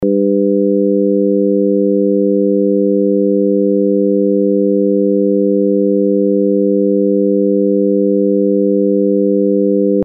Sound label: 200 Hz 300 Hz 400 Hz 500 Hz bez 100 Hz